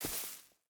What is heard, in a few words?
footstep boots grass walk; Rust